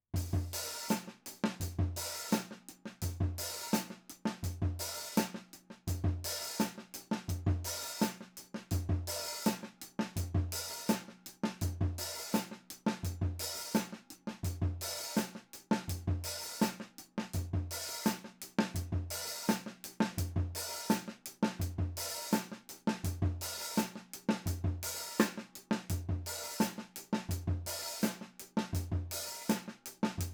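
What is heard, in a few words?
jungle overhead right, drums; film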